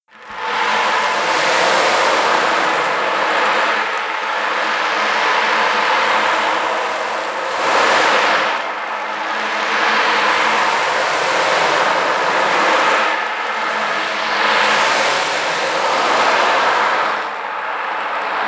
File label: ocean drum 30 cm, ocean drum 30 cm prirodni